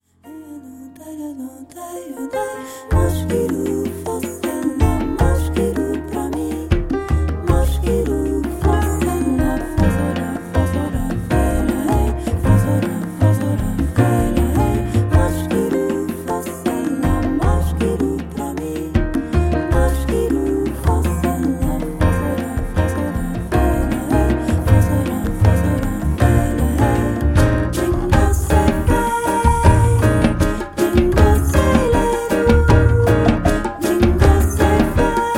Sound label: Folk